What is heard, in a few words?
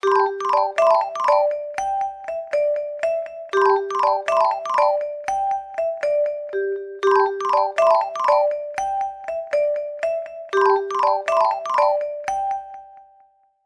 Gliss, ringtones; ZTE Axon 20 5G